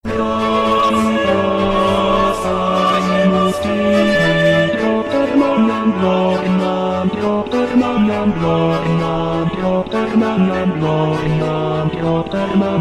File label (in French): Gloria basse; Stage Zelenka 2023; zelenka zwv21